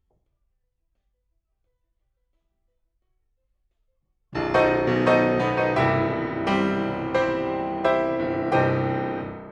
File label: PIANO